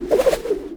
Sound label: Grapple Cast; Valkyr Rework; Warframe; Berserker